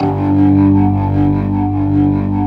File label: G1, Keyboards Old School; USB Soundscan vol.09; AKAI; BIG HAMM